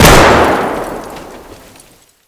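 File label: grenade explosion indoor close; Escape from Tarkov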